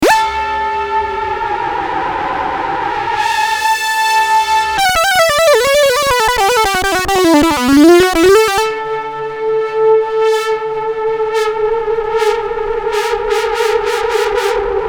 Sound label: Hot Alias Chick, Prophet '08